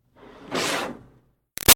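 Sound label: Water Spray Interior Car Grill Fast; Car Wash; Spraying; Objects; BigRoomSound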